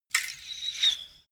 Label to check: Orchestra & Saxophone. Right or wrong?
Wrong